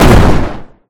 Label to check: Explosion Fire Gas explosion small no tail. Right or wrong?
Right